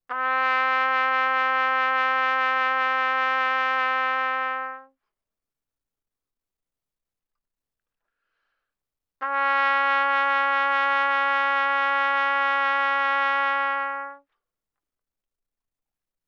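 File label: Trumpet vibrato mf C4 B4; Brass; Bbtrumpet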